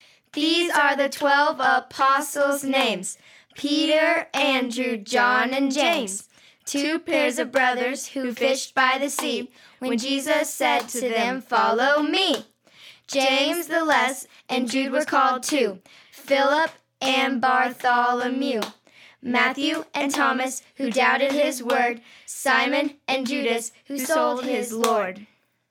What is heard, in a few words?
Apostles Chant; 5th Grade; Hymns & Memory Work